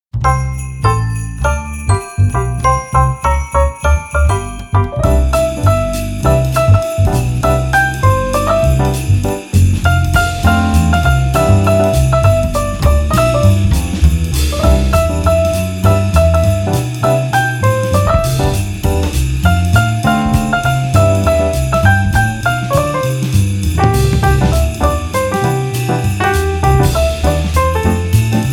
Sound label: jingle bells